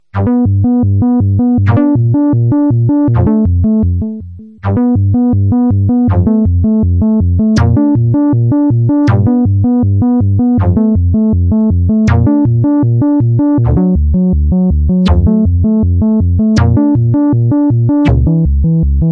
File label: synth soft, yamaha tx81Z; very soft